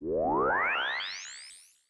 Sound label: Gate; ambience